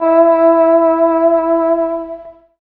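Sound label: HORN delay; East West - Phat & Phunky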